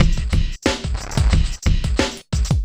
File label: Street Tip, R&B Drums